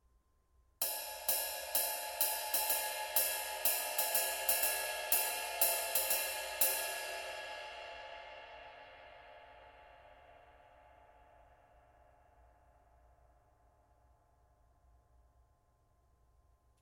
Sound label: 22in Light Ride